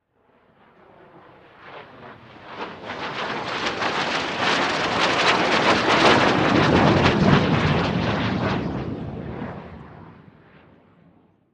Plane MiG-29 Fulcrum Far

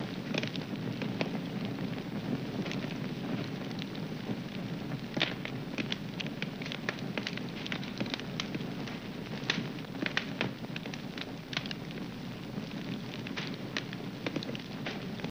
Fire Light Crackle, torches

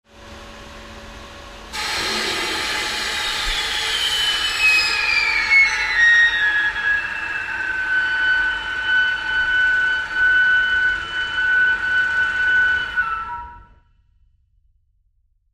Air Blast Fast Heavy

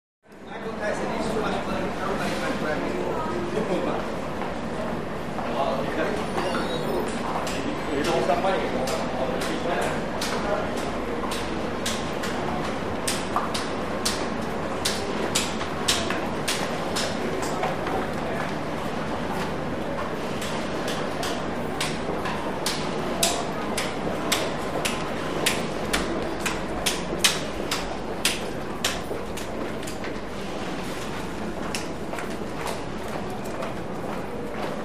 Single Metallic Foot, stairs

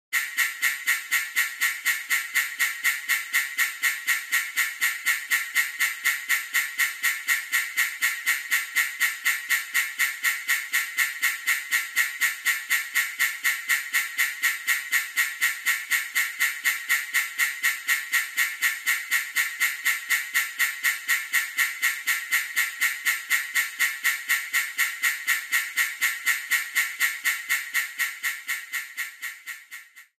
Alarm Strobe Light

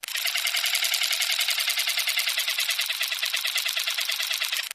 Motor, Small; Electric Toothbrush

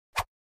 Fencing Sword Swish

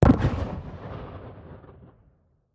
2x8oz Black Powder

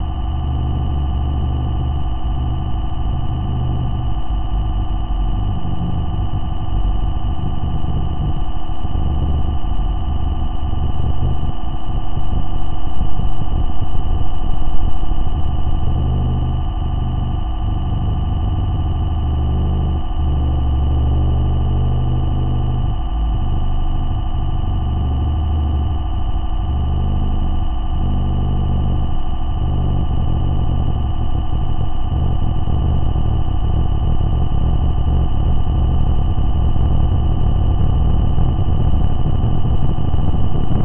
Computer Room Digital L, communications

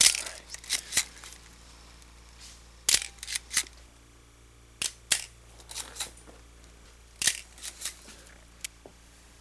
Camera Generic Clic; shutters & movement

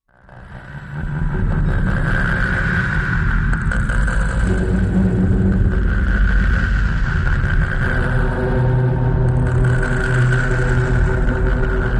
Low Riser Static Elect W, sci-fi ambience

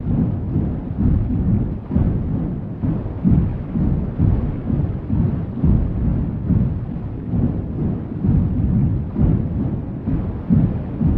Propeller Movement Under

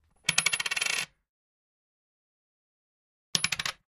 Coin Drops X2, coins

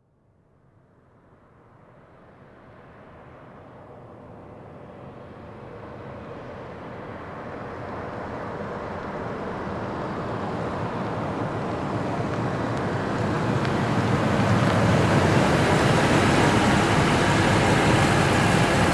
Expedition Slow Idle, ford expedition

